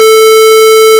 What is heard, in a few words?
square simple